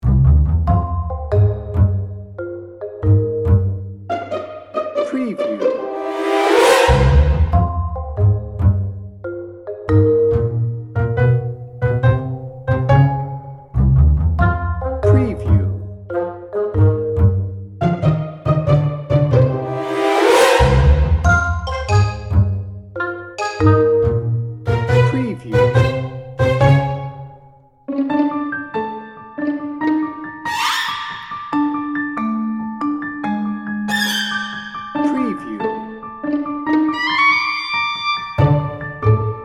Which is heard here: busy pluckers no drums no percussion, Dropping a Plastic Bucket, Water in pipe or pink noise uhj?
busy pluckers no drums no percussion